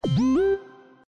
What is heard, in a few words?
Bluetooth, tone; ZTE F451; Embedded